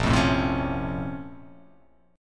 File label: A sharp Minor Major 9th, down